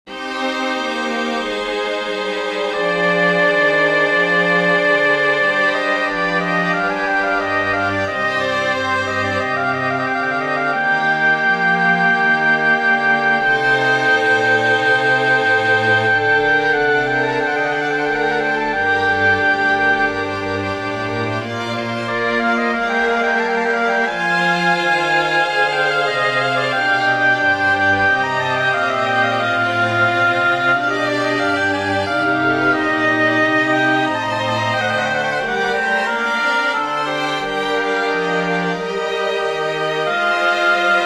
sinfonia for oboe strings; Johann Sebastian Bach; ich hatte viel beka mmernis

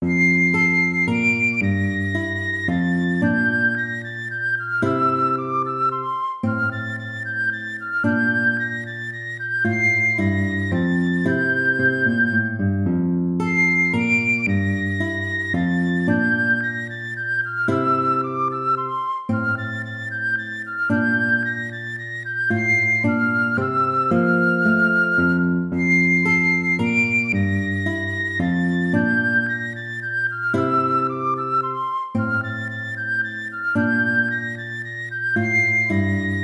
minuet major

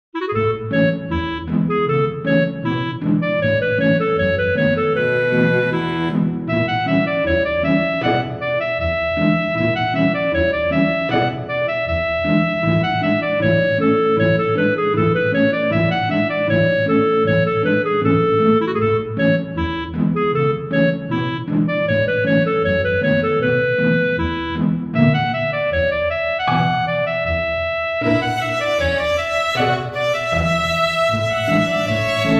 norwegian dance major for clarinet strings; grieg edvard

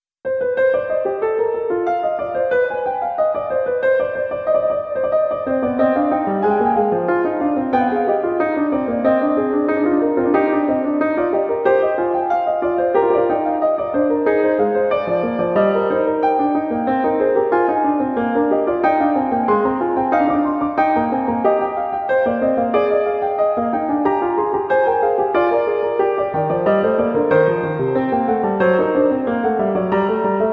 inventions a 2 voix piano; bach johann sebastian; 15 inventions

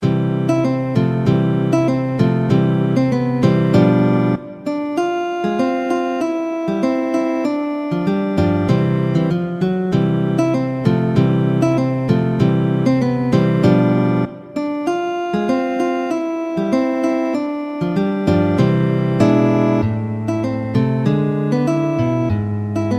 tango; vinas; capullos de abril

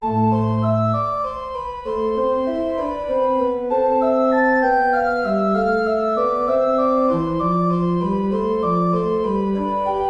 gigue

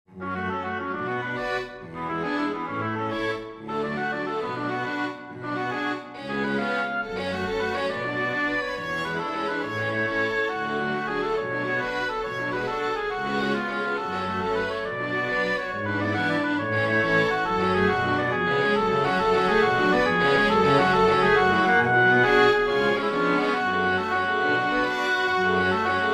allegro leggiero for oboe strings; mendelssohn bartholdy felix; lieder ohne worte

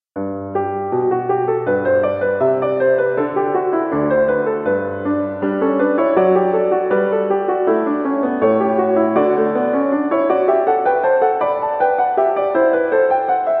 inventions a 3 voix piano; bach johann sebastian

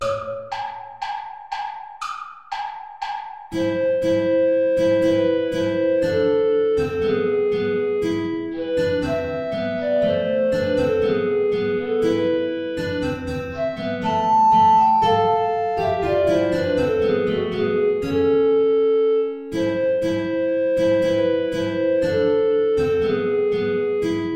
play along without guitar; hilton john; you lovers that have loves astray

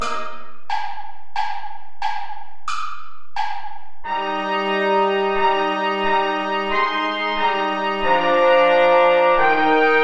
play along without trombone; praetorius michael

